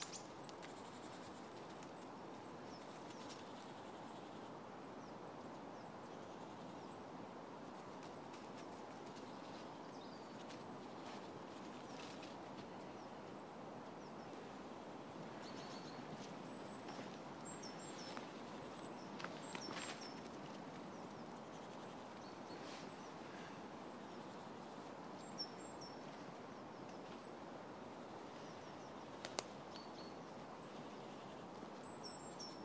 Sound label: ambience woods feb cheshire